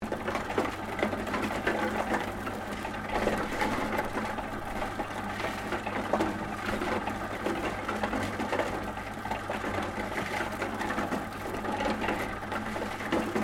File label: Cement mixer operating engine loop, Trailer portable cement mixer; Construction Vehicles